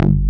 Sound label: PAN BASS; Pro-Rec - Synthsonic